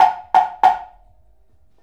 Slit Drum; Sonic Foundry (Sony Creative Software); Essential Percussion; Drums

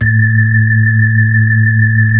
B-3, hollow fast

